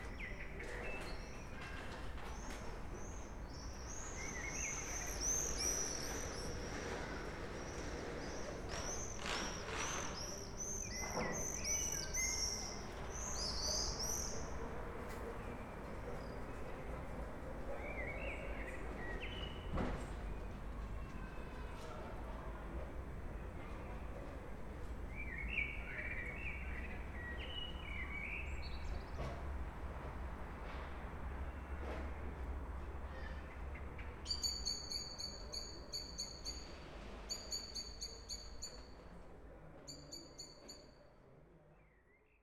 martinets

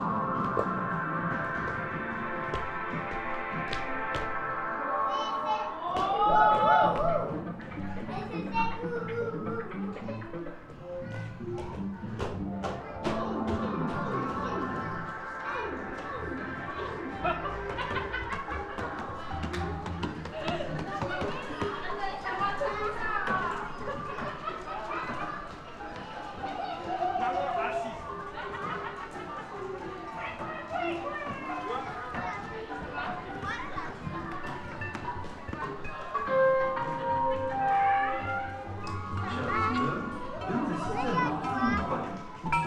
brouhaharium

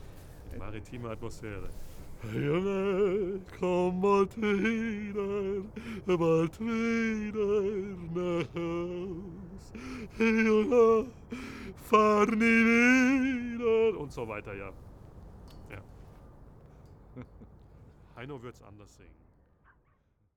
maritime

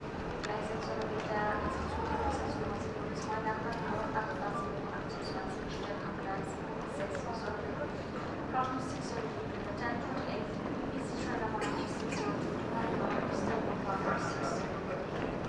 bruxelles-midi

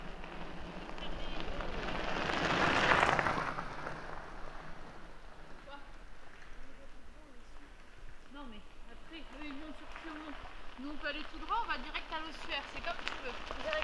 vtt; douaumont

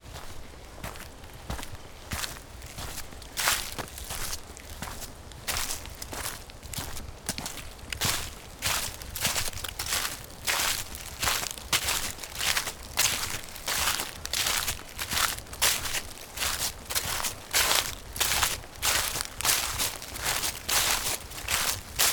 marcher dans les feuilles

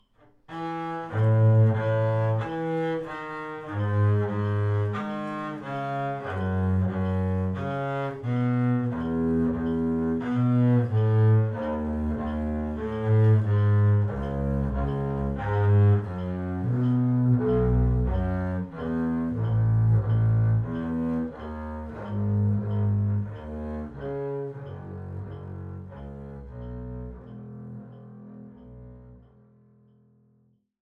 contrebasse

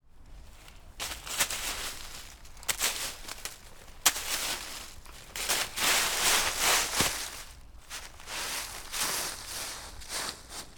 feuilles

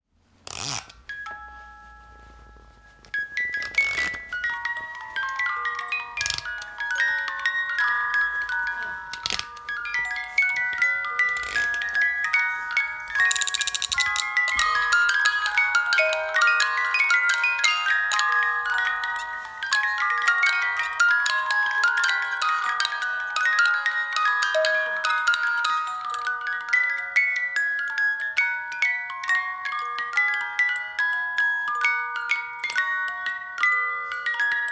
boites

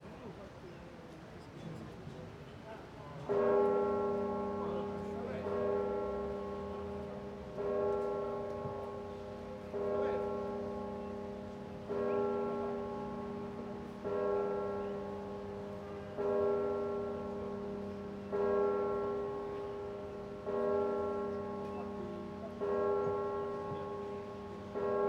cloches pompei

